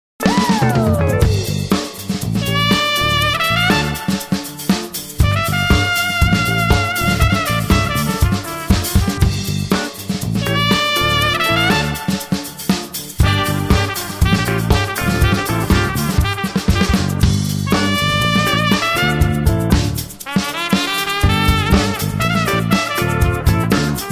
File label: solo